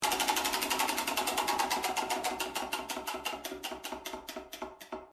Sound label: spin prize